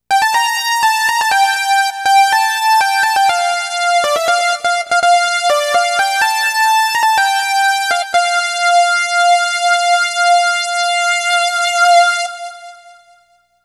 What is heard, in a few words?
solo